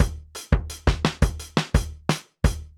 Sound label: funk 86; funk loops